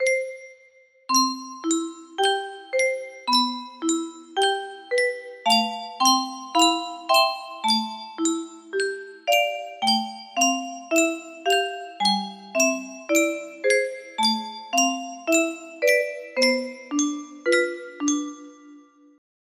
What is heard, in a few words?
random melody, music box melody